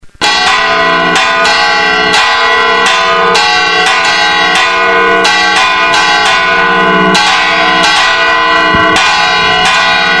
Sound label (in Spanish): campanas iglesia